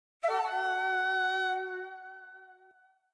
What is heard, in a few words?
Fanfare; Ninja; HD-2D